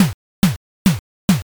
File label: footstep walk